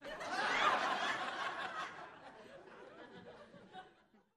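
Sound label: Crowd 50 Single Medium, small int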